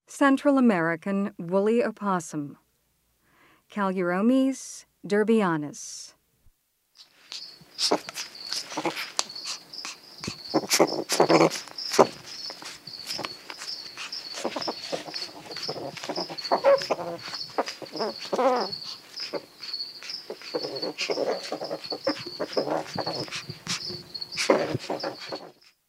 Central American Woolly Opossum, Mammals of the Rainforest